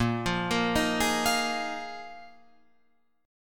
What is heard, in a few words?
Bb 7th Suspended 4th Bb Guitar Open F; arpeggiated